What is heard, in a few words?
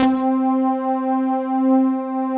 VAMPING C4, Synth; Big Fish Audio; Synth City; DRACULA